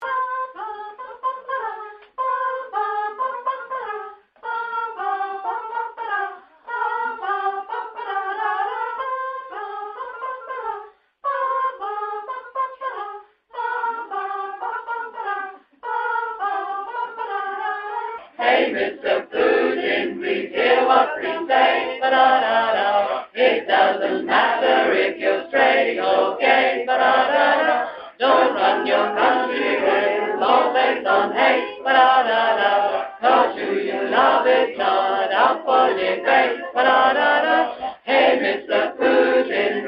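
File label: Soprano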